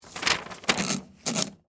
Typewriter Load Paper, Technology, Typewriter, Load, Paper; Typing; Offices; BlastWave